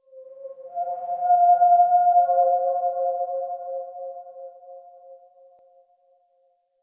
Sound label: Texture Interior Small, Ambience; Sentient Lair; Warframe